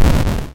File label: explode; fife-parpg; shooter